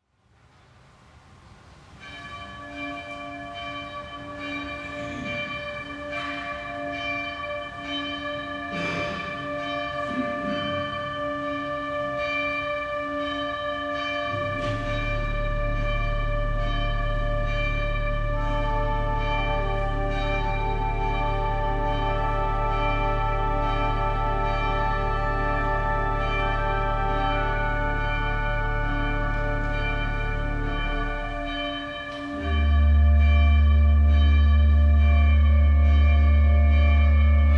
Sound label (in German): Glockenkonzert; St Josef